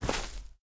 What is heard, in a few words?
grass, step; STALKER; minecraft